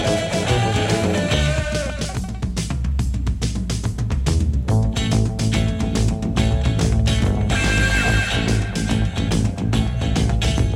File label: safari rock